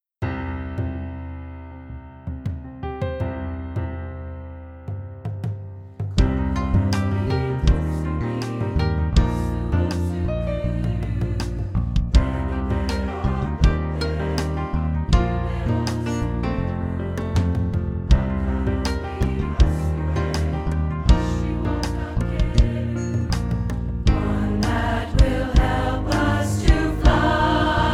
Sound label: Coke building alto